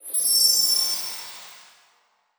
magic sparkle whoosh

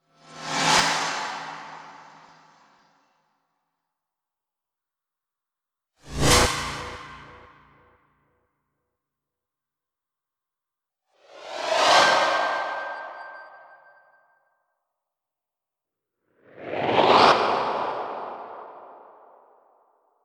heavy whoosh cinematic

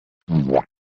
boo womp sound effect

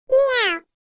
funny cat meow

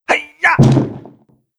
karate fighter hitting

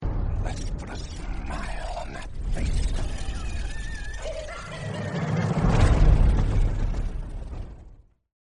ha ha ha; joker

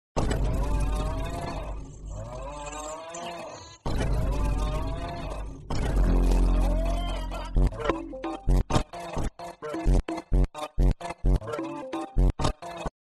goofy ahh song

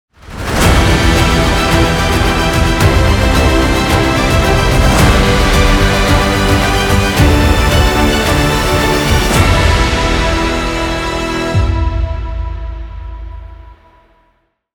epic grand intro

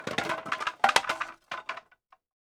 wood plank dominoes fall

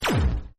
laser gun; roblox

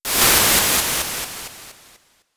sea wave noise

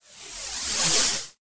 electric gamma ray whoosh

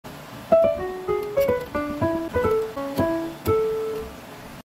nokia ringtone piano

